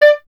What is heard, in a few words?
clarinet D, Solo Instruments; Miroslav Vitous - Solo Instruments VOL-1; 90 s Sample CDs